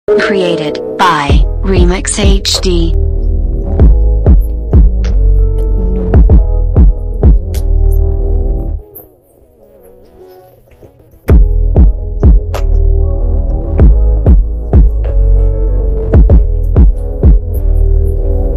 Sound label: Instrumental